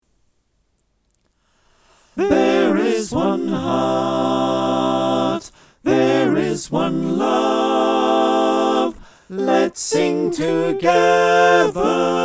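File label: soprano alto tenor bass, choir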